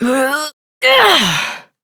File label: Effort Heavy, Adult; Warframe; Duviri; Drifter; Efforts